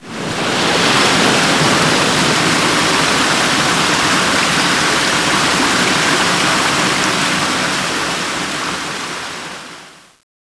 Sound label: water sprinkler